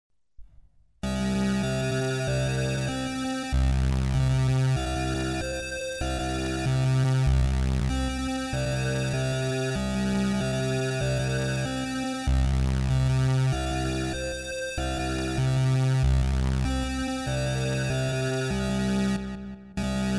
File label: Incomplete Thunderstorm Stems